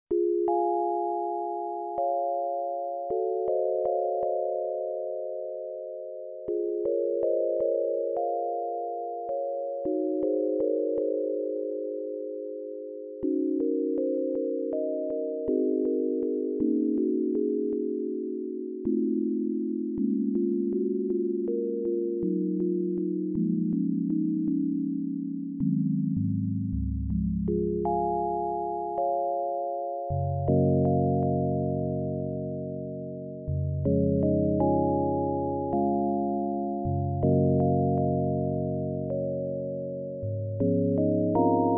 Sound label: bell